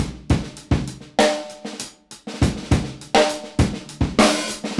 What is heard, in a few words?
beat loop 100